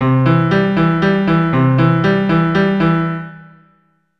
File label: C Arp pattern, keyboard